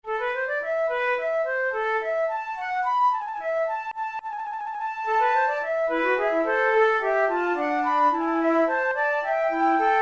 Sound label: for two flutes litalienne; Monteclair; 6 concerts; flute tunes